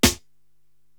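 snare drum Tite & Bright, Drum Machines, Korg TR-Rack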